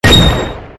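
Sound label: Finish Success; Burnin' Rubber 5 HD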